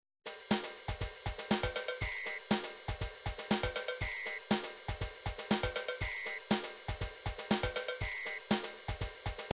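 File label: drums pattern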